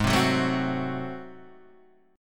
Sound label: Ab 7th Flat 9th Guitar Open D, chord